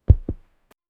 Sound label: jrpg game 2d character